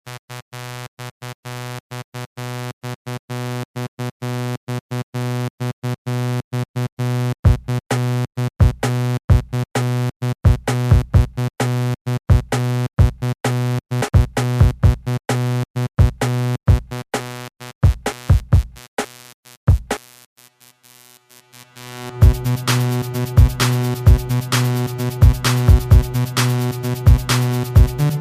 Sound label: Jingle Bells Breaks